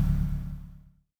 stop, trueno; sgmcars

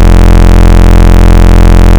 static; slender; zerosiki

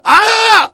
pain sharp

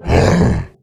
attack, male zombie; nmrih; zerosiki; slender

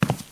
footstep; slender

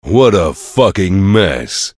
soldier negative vocalization

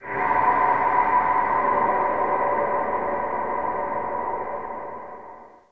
stinger; skinwalker goatman; slender skinwalker

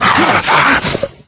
death, male zombie; nmrih; zerosiki; slender

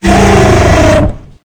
thumper chase; lethalcompany; zerosiki; slender

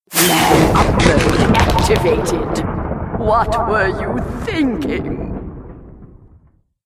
rage pig; freak fortress 2

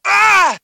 stab; potry v2; shulk

